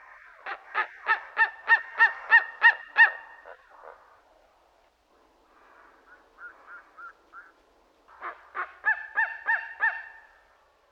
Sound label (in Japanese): oowashi, bird